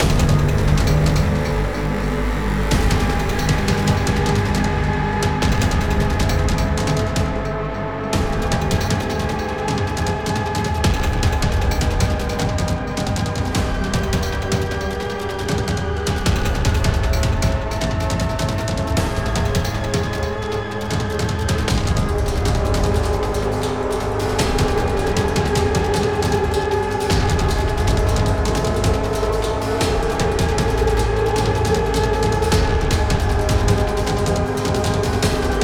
Final Boss Loop; skykeepers; ps4 windows xbox one gamerip 2017

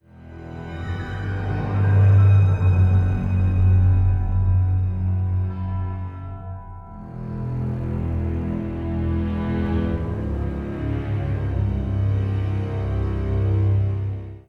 cutscene music; Mystery Legends: Phantom of the Opera

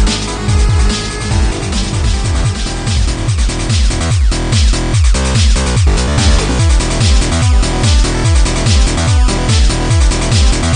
BOSS MUSIC; Diamond Hollow 2

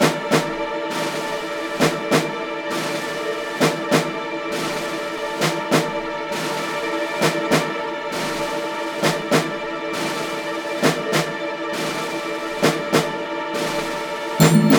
Low Action Loops

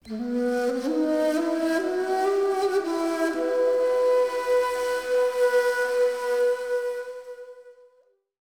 Orient Flute; rise of venice windows gamerip 2013

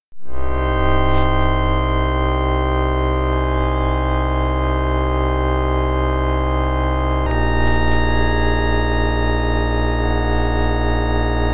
Opening Sequence; Space Quest 3; MS-DOS

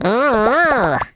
China Click Sound; Jolly Battle

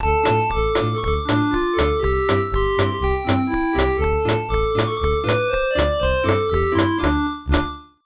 Sailors in Tavern (Drunken Sailor); King's Quest III To Heir is Human; Apple IIGS

